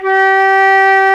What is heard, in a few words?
FLT XFLT, Flutes; Club-50 - Foundations Roland